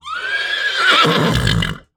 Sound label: horse whinny; prosoundfx